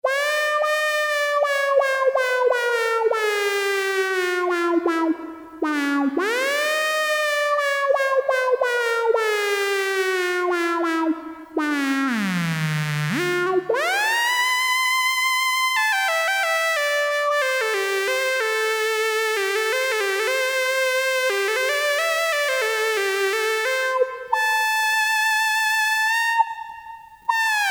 Violin + wha wha + portamento, Farfisa Syntorchestra Mono